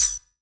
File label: Yamaha CS1x Drums